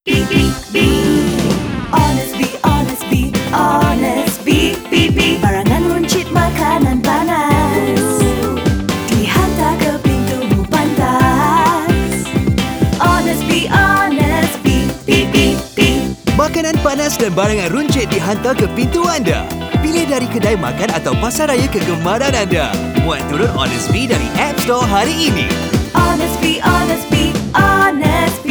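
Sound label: Jingle Malay; 30 Secs; Honestbee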